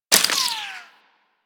Bullet Impact Debris Wood

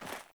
Sandals Run Snow, Footsteps

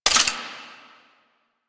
Sniper Zoom Out; DE; Warframe; Warframes; Frumentarius; ExaltedSniper

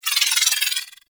Pistol Proj Whiz; weapons; Warframe; Thanotech

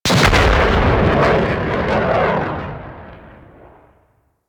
Enemy Ship Initial Death Explosion; Rail Jack

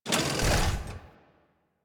Mech Step Arm Down; Nechro Mech; Vehicles

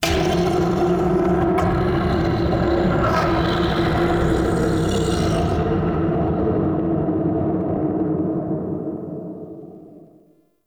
Chasm Scream; Ambience; Man In The Wall; Entrati

